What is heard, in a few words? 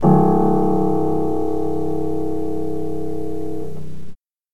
Piano